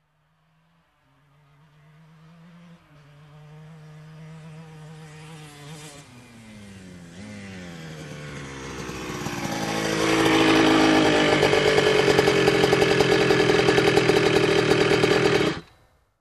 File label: Motorcycle approaching and stopping